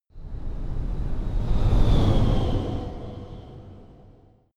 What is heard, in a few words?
sci-fi vehicle train passing; raumschiff